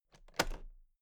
toolbox latches open regular

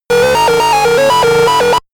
game arcade race

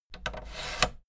fader plastic scrape double up medium

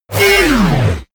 wind down

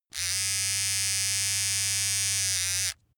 razor small

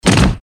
heavy metallic step

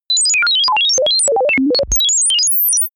chaos sine

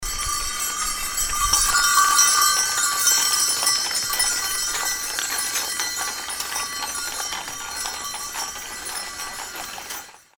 horse carriage with bell

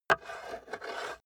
metal scrape on wood impact

